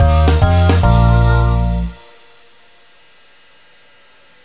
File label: Ice Win; Magnetic Joe; DSiWare; NDS